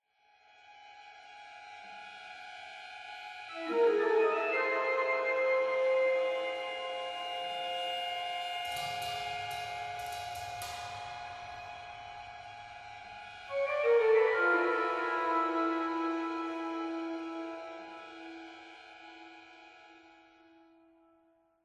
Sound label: Ambient